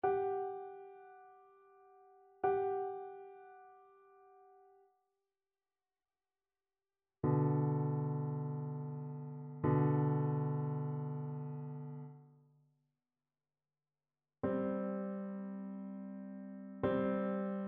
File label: intervals